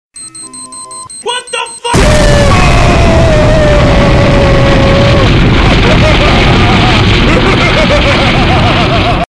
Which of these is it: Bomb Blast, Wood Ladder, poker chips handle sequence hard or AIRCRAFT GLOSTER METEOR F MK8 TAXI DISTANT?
Bomb Blast